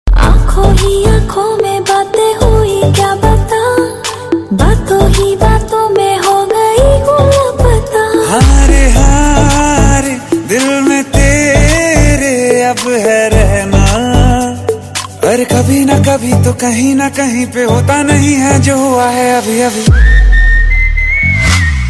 Ringtone

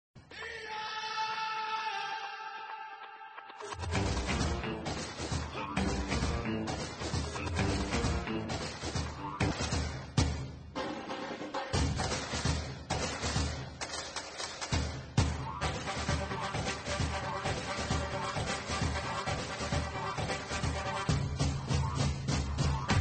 Instrumental Ringtone

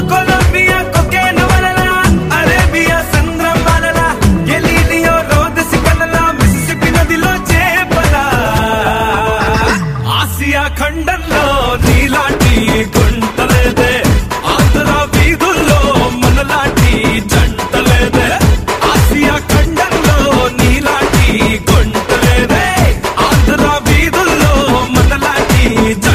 Ringtone

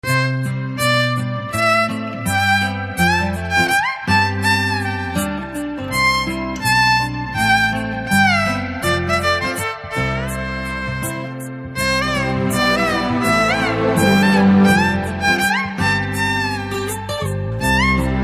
Fun BGM Guitar Ringtone

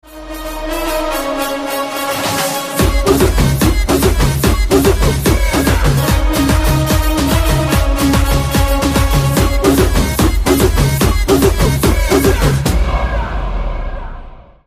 Mass BGM Theme Ringtone

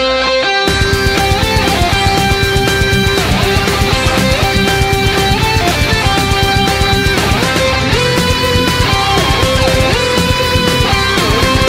Metal Ringtone

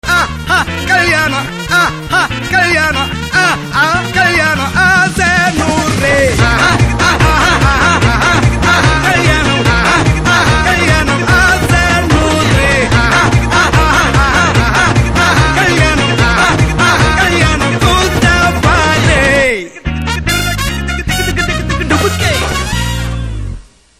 Ringtone

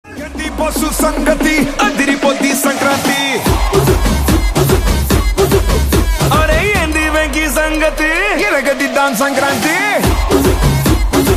Mass Sankranthi DJ Ringtone

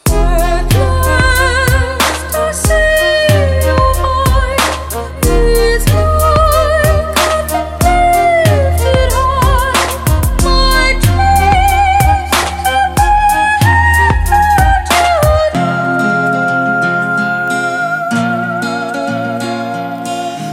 BG Tone

